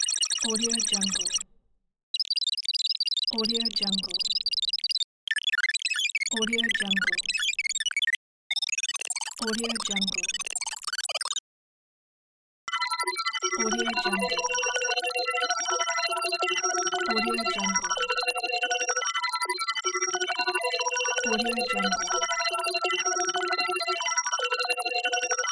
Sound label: computer sequence beep loop